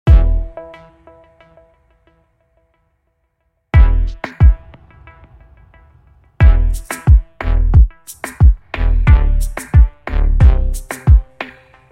Instrumental

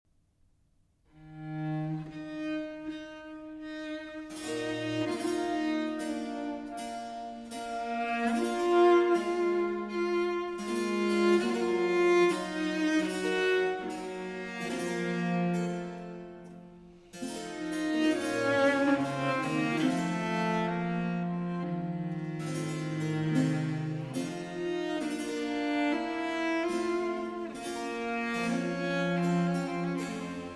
Sonata For Cello and Continuo in E minor; Vivaldi; R.40

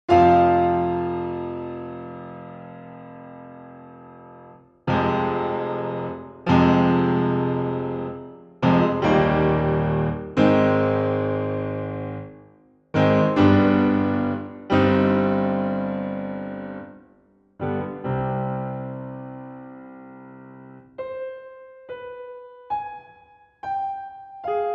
Overture, Solo Piano; Egmont, Op. 84

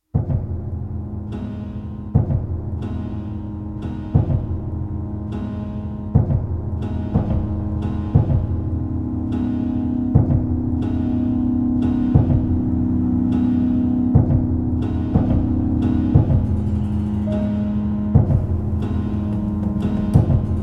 Lyre

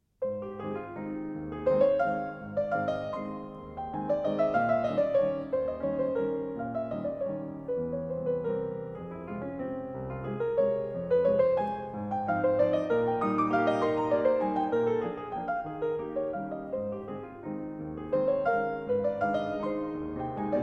Waltz No. 8 in A-Flat Major

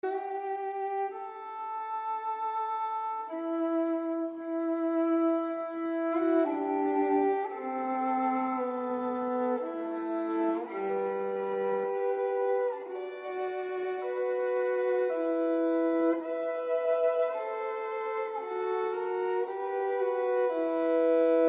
Violin Sonata in A Minor; Op. 27 No. 2; II. Malinconia

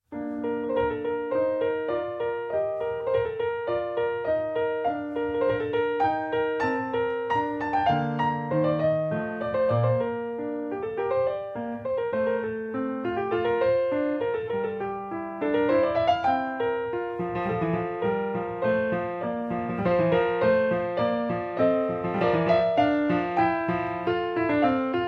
Partita in B-Flat Major

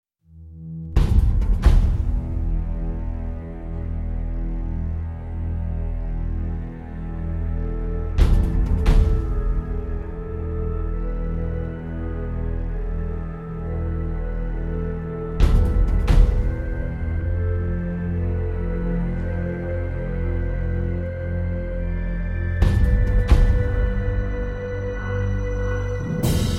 Adagio For Strings; Barber

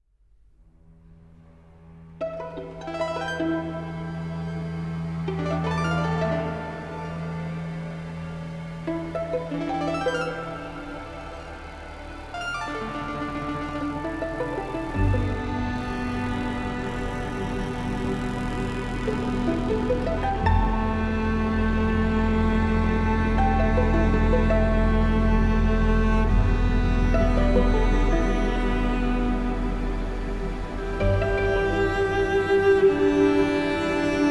Solo Violin, Strings and Electronics

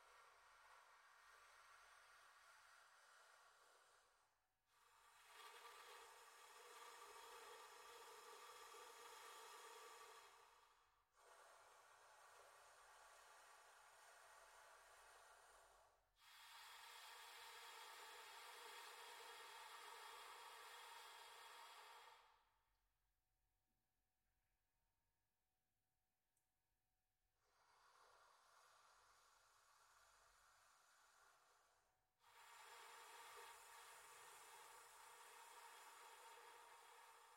Lullaby For Alto Saxophone

